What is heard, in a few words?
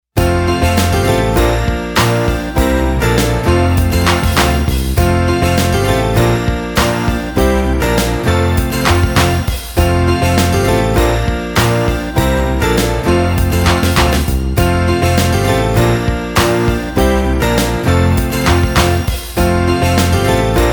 Instrumental; Kids Liturgy; Year A